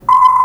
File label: Bip; Probot